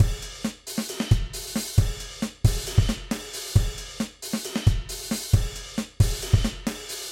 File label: Drum Loop